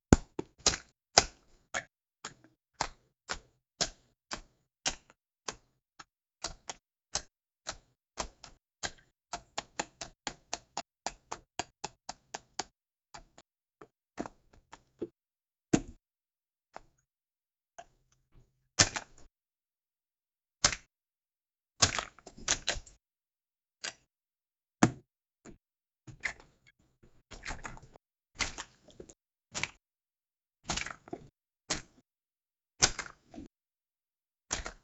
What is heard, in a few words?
punching someone